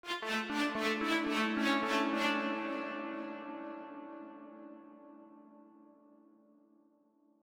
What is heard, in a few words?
staccato